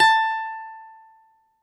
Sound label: BALALAIKA, Russian Strings